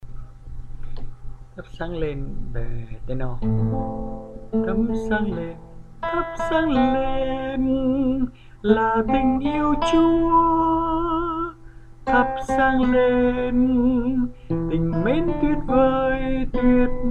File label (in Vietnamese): Thắp Sáng Trong Con, bè Nam